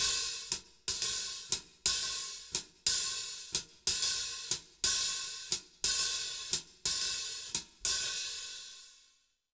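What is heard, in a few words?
120 SHUFFLE, Jazz Latin Drumloops; USB Soundscan vol.08; AKAI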